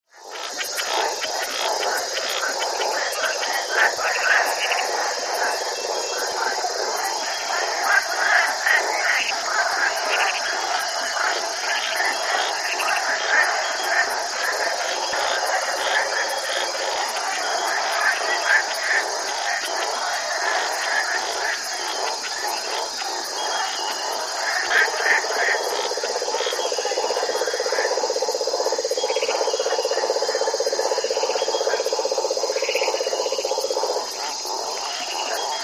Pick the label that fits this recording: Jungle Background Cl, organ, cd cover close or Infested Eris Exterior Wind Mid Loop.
Jungle Background Cl